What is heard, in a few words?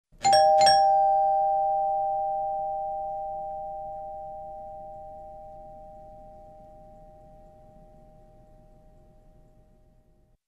Doorbell with long ringout